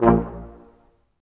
BRS Quintet staccato, Roland; Roland L-CD702

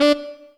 SHORT SPIT; Greytsounds - Scott Peers Sound Engineering

